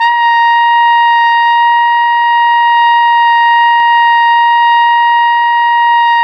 Flugelhorn; Roland LCDP12 Solo Brass

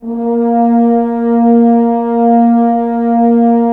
Horns; Roland - LA Composer vol. 4; HZ Horns

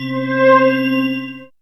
Ambient Atmos, Dance Synths; Best Service ProSamples vol.37

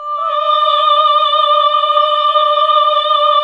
HAL AAH, Nuns Aahhh

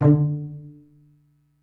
pizz D2, pizz section; Greytsounds VOL-1

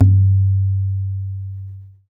TABLA L

